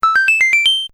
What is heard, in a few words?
1 up; mario